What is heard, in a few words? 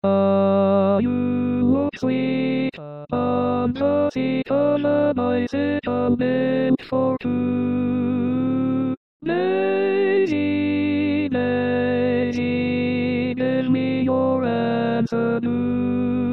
tenor